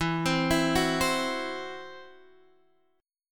E Suspended 2nd Sharp 5th E, down, Guitar, Half Step Down